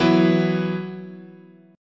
E Suspended 2nd, chord

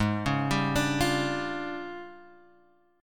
D Minor Major 7th Double Flat 5th, G sharp

